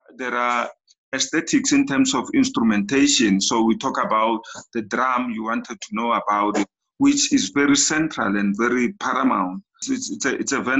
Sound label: azah drum